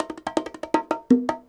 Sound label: bongos 80 bpm, latin